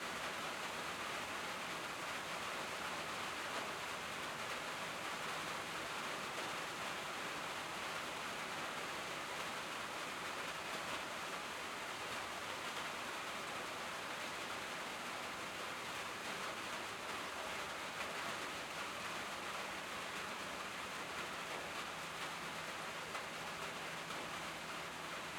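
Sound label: Rain Interior Shed, Metal roof, Heavy rain increase; Indoor Ambiences; Borg Sound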